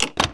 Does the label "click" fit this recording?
Yes